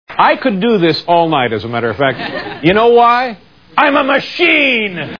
machine; Letterman

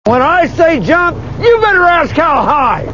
jump; Mail Call

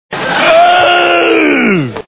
uhhooooh; Letterman

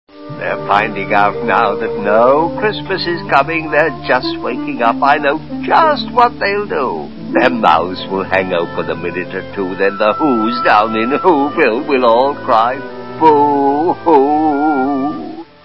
boo hoo; How the Grinch Stole Christmas TV Show; Boris Karloff as the narrator: "They're finding out now that no Christmas is coming. They're just waking up. I know just what they'll do

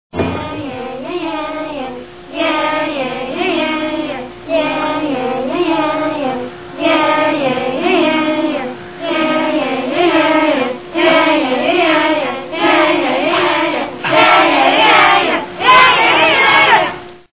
yaah yaah; Star Trek

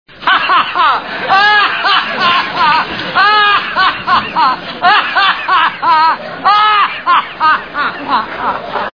hahaha; Letterman

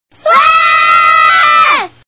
aahh; lisa; Simpsons

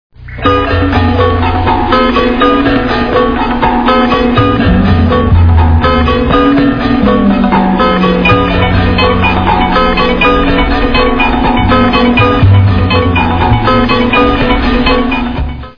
music; American Beauty

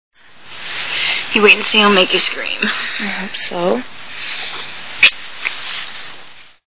make you scream; Six Feet Under

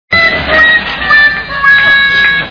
waah waah; Letterman

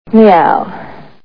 meow; Batman

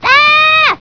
aaahh; lisa; Simpsons

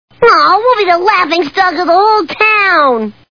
laughing; bart; Simpsons

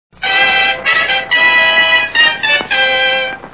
fanfare; Bullwinkle

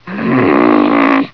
groan; lisa; Simpsons

